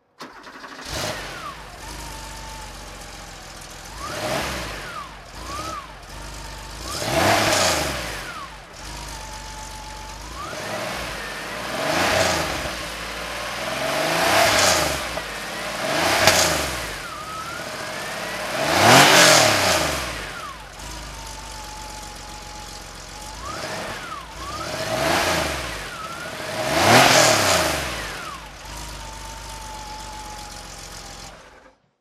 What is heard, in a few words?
porsche carrera 3.2 coupe throttle